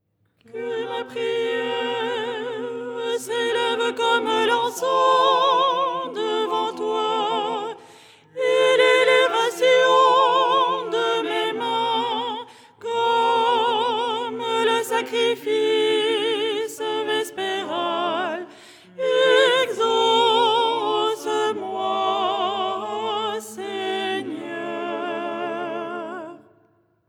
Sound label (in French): soprano; " Que ma prière s'élève…"; Les 8 tons en français